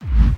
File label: BD Gate FX, Bassdrums; Vengeance Samples